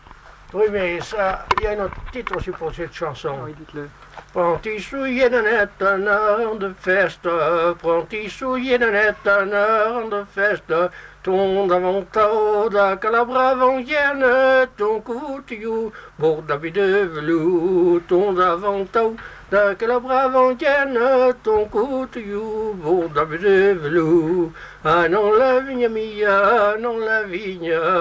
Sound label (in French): chant